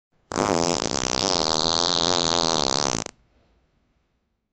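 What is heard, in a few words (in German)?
lustiges furzgeräusch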